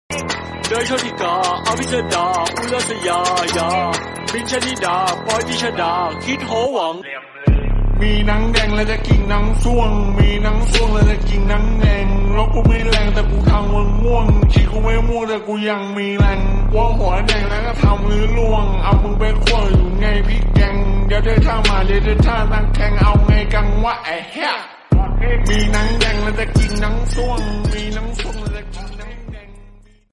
slowed remix song

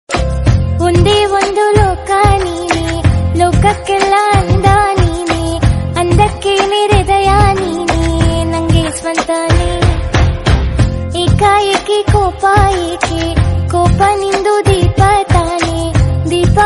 female version

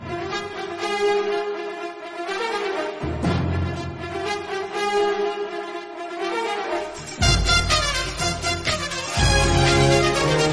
theme instrumental bgm; jailer

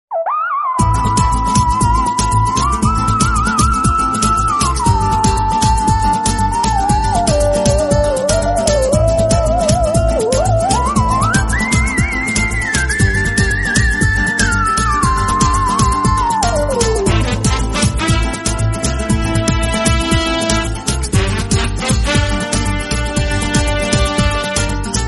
theme bgm instrumental; Shinji Vizard

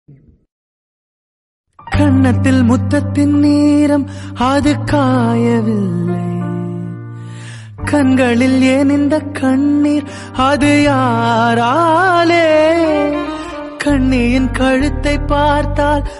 heart touching love bgm, lyrics, hindi

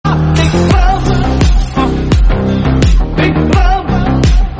promo, bgm; vikram; kamal hasan

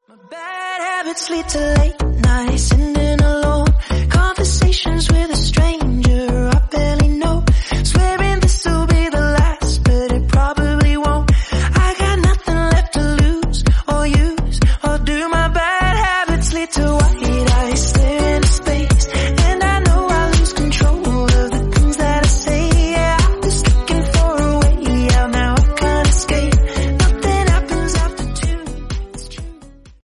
english instrumental

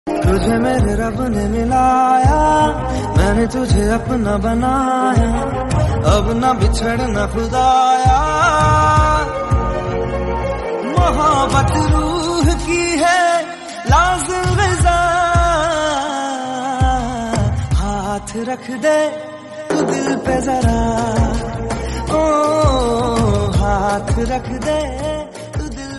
romantic hindi

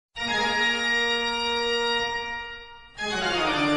meme instrumental music; blue lobster

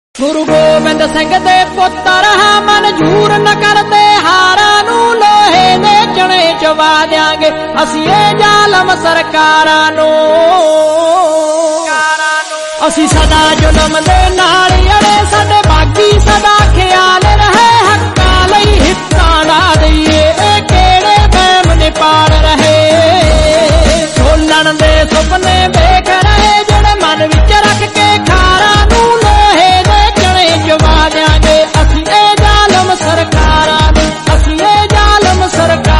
punjabi bhajan

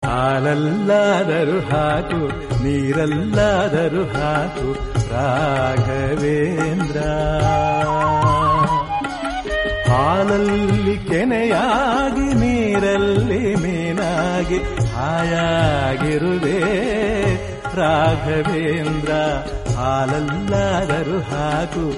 Kannada devotional song

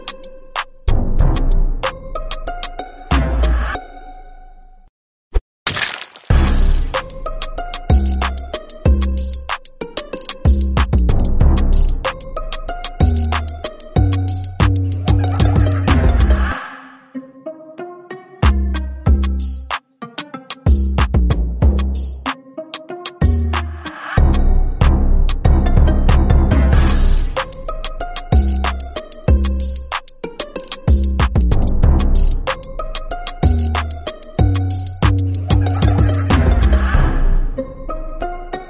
drip message tone; among us

